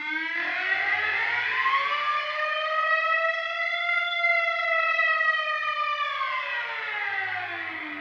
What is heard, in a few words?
Ambience alarm; adams venture origins